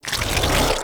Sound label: Roar Cast; Warframe; Excalibur Umbra